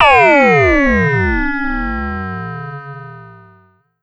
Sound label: Pollard Syndrum